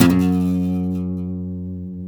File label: G, Martin, guitars; Spectrasonics; Hans Zimmer Guitars VOL-1; MARTIN HAMMR